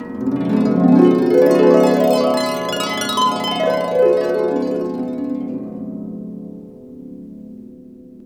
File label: HRP GLISS; Roland - LA Composer vol. 1